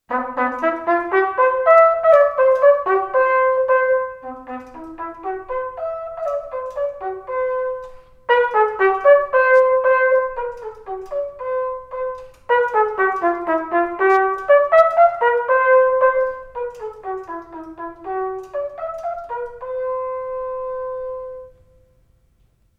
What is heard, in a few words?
Flugel Horn